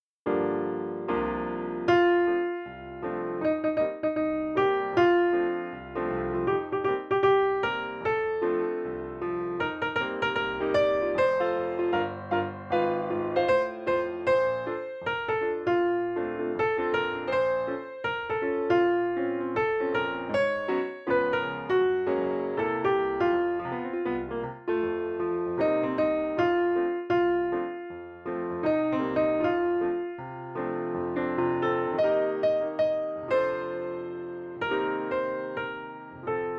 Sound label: Melody; Johnny Ringo